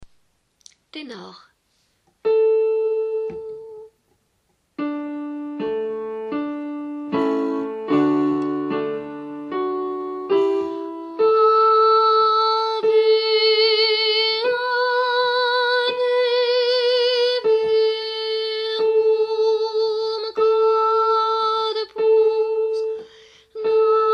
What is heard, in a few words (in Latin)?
ave verum, tenor